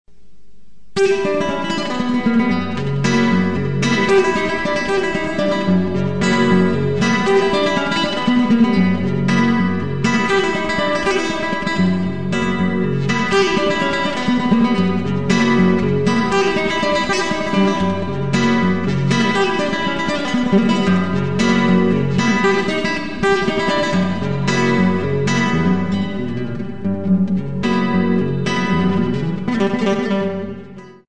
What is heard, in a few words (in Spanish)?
Tocata de Flamenco